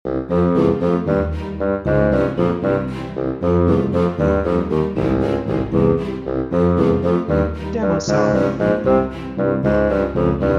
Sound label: Bouncy Little Tale Loop